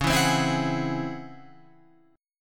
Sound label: C sharp Minor Major 11th C sharp Guitar Open F; C#mM11 strummed; 13,11,12,11,11,13; JGuitar